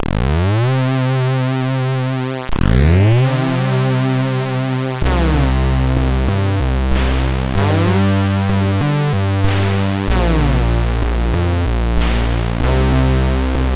tune, MOS6581R3; Magic 2

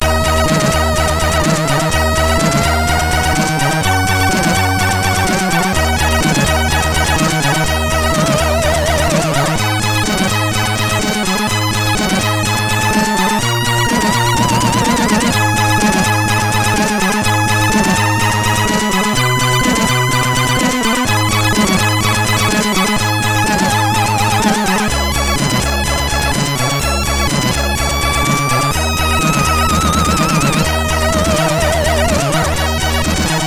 Arm tune, MOS6581R4; Surland Jacob

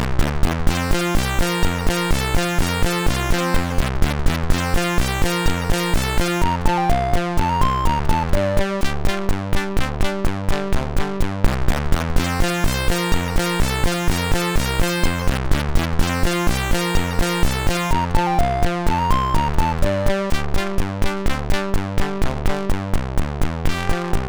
Clik Clak, MOS6581R3

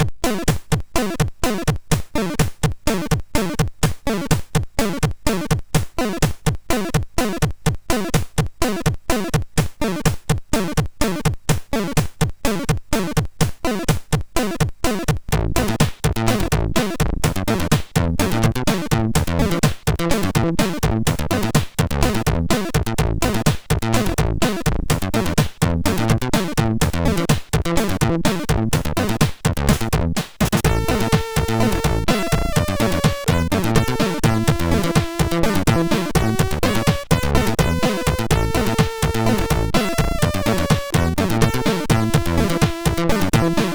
Dance Tune sid MOS6581R4

